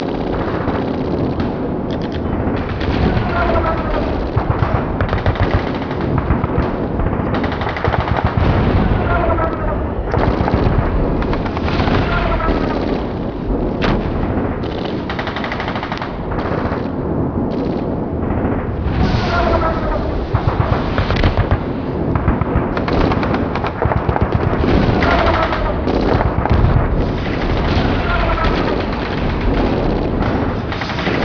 prop ambient

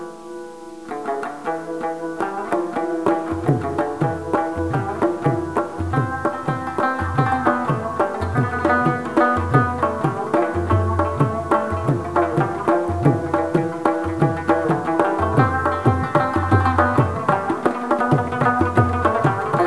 music

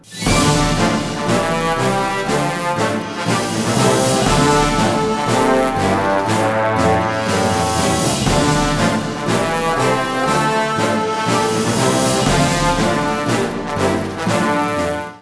win

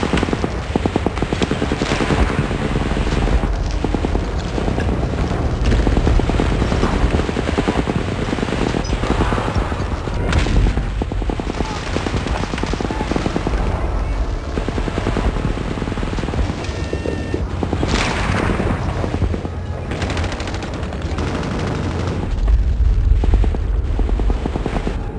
battle

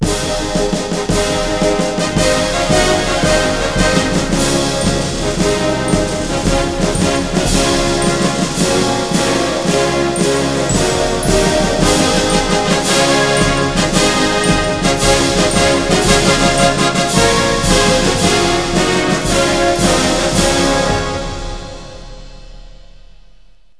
german win

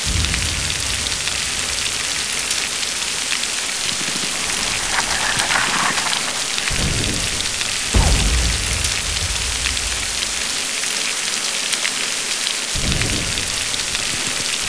rainy amb

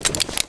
webley draw

